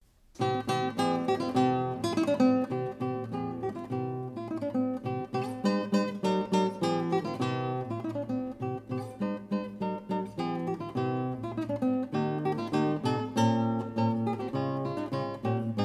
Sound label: Renaissance Danse Arr for Guitar